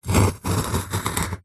industrial tool knife large metal sharp tip score wood